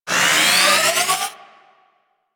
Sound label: Crp Survivors Upload Power Up, Infested; Warframe; Infested Eris; Encounters